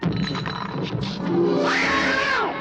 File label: intro meow; player